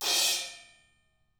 ZILDA CHOKE

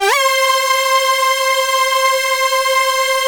lead synth

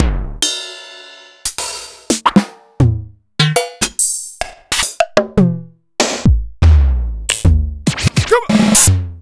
EMU Proteus-2000; Sliced; m8

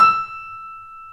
WARM PIANO

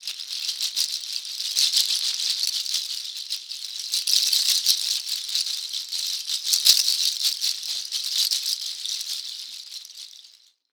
SHKR PIG LO, Synclavier Percussion; Synclavier Percussion 2; World & Orchestral; ILIO

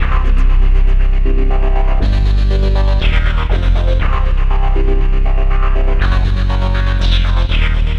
Pulsating Bass; Basses; Dr. Fink's Funk Factory

